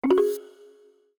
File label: Voice Suppression On; Medal